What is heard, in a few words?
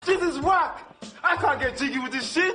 african ringtone